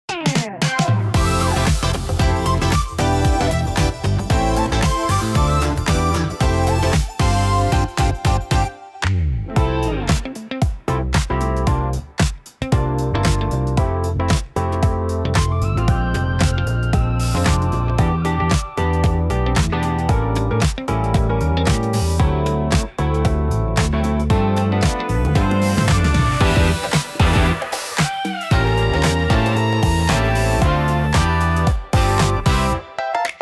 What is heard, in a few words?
rain pop